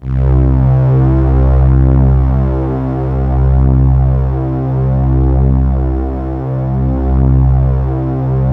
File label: PAD7 C2; USB Soundscan vol.05 - Explosive Jungle; AKAI